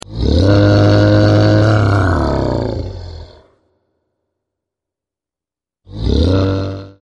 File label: rumbling gorgosaurus